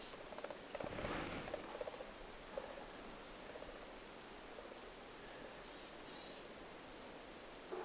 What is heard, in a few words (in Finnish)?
käpytikan siipiääniä lennossa